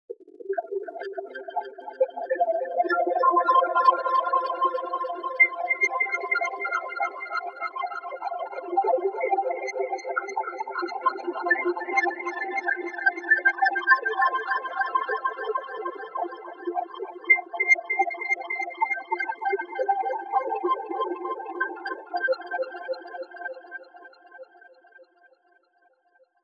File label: pad ambience aero F maj; base pack